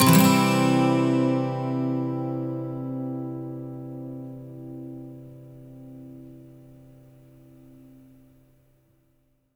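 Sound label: 12 string major C, Electric & Acoustic Guitar Loops; USB Soundscan vol.04; AKAI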